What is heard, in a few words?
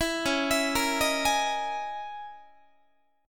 Db Minor 6th Add 9th Db down Guitar; arpeggiated; 4A,4E,5A,5D,5G#,6C#